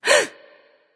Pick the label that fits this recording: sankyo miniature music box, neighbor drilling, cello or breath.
breath